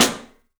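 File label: PICOLO SNRS, snare, drums; 3D Sound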